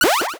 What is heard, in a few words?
pickup; Fruit Hunter